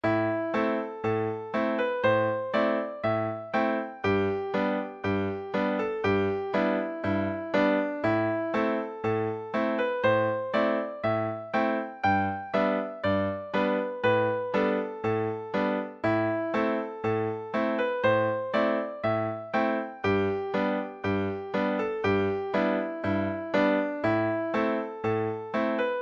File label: polka; ballydesmond